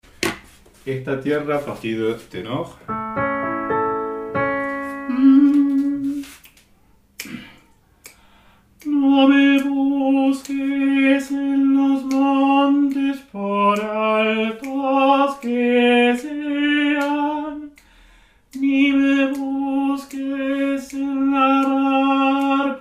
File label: Tenor